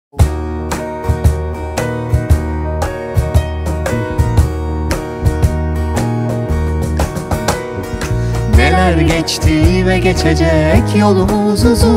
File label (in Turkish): akustik